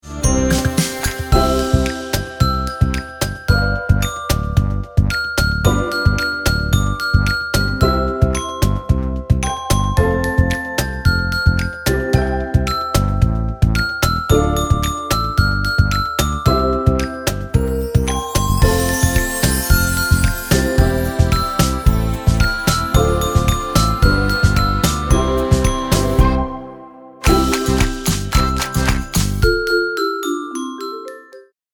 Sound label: instrumental